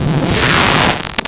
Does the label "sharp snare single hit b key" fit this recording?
No